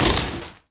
window break

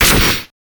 weapon staff orb shot

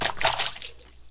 water hit

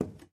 footstep wood

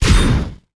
sword sundering strike explosion

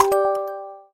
notification start

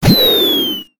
weapon bomb throw

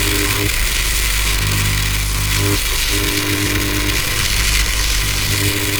player shocked loop

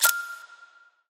ping coming

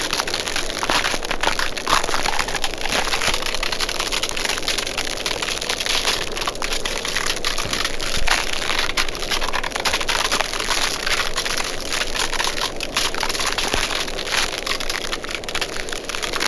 player chilled loop

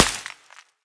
stone break

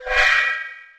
magic attack; weapons

